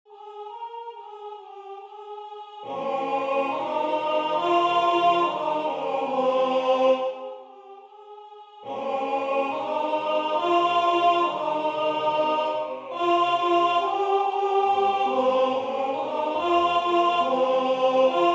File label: Alto, chorale; Ave Maria; Aymé Kunc